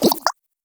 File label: Double Jump